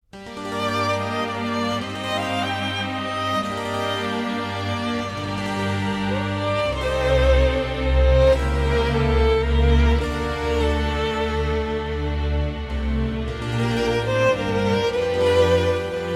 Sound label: Trill